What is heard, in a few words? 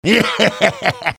laugh evil; demoman